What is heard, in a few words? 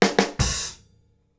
KICK SNARE, Live Snares